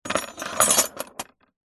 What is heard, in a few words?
handcuffs metal heavy set down on wood surface